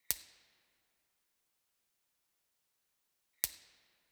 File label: Musikverein, Theatres